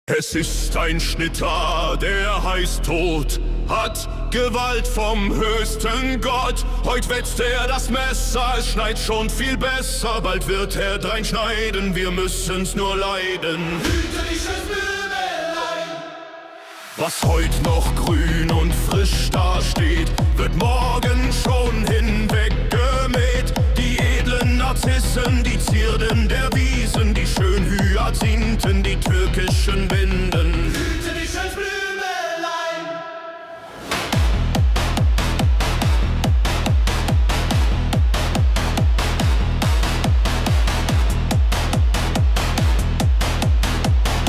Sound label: Techno; Schnitterlied